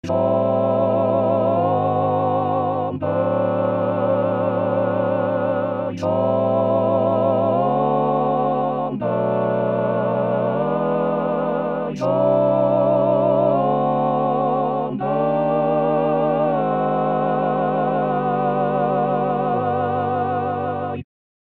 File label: All Parts, barbershop